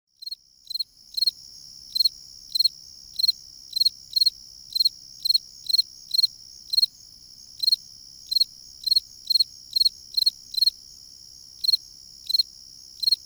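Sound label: field crickets, Northern Illinois Insect Calls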